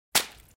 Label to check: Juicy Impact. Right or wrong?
Right